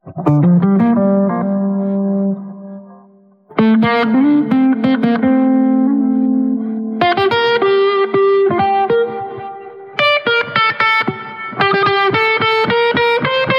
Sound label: GUIT